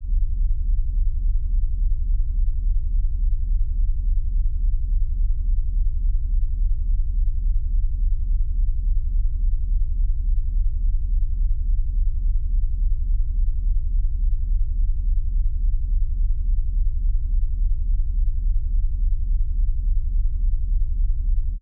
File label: LFE Rumble; 344 Audio; Trailer Instruments Designed